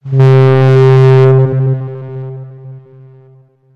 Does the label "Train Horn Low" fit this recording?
Yes